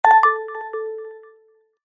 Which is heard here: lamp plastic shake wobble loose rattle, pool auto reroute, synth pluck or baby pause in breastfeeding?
pool auto reroute